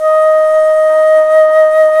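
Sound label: alto flute, vibrato; Roland L-CDX-03 Disk 1; A.Flt vib 1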